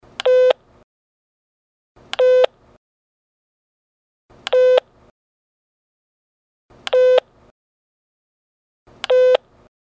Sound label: PTT